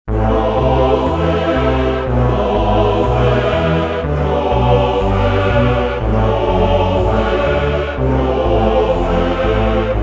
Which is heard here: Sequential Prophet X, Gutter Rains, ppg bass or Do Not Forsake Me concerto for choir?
Sequential Prophet X